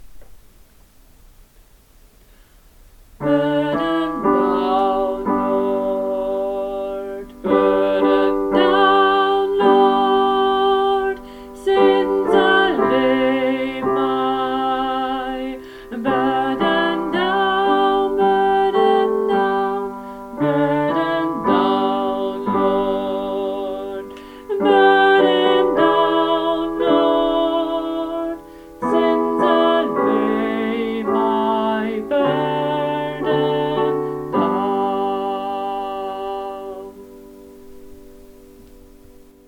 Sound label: burden bass